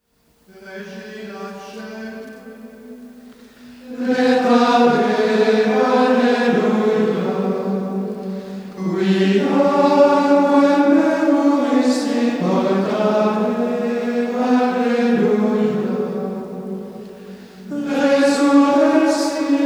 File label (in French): Choeur des Moines, Regina Coeli; Abbaye Sainte-Madeleine du Barroux